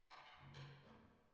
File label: Drums Verb